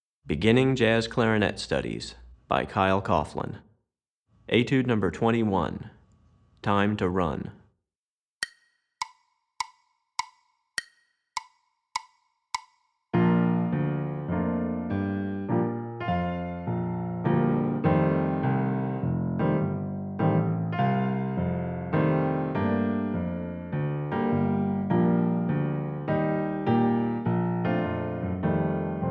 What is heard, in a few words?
Piano; Recordings For Beginning Jazz Clarinet Studies, Volume 2; Piano Accompaniment for Etude #21; Running Eight Notes